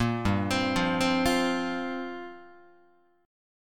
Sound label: G Minor 11th G down Guitar; Gm11 arpeggiated; JGuitar